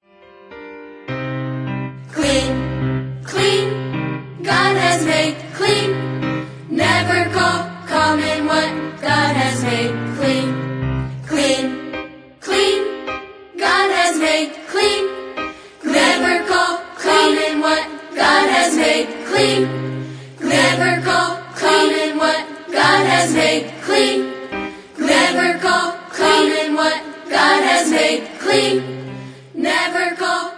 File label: Clean, Clean